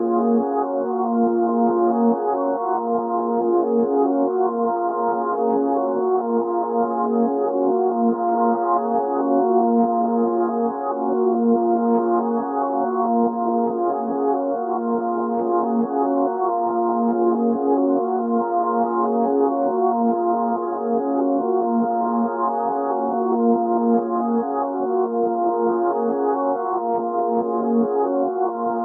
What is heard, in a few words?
140 BPM A# Min Melody, Reverse; Loop Stems; Cymatics; 2022 Melody Collection; Eternity